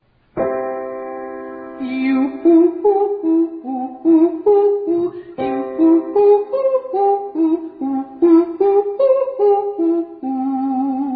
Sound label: Triads Octaves Yoo Ascending